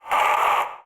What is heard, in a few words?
Vocal Pain; Warframe; PriestQuest; PossessedRedVeil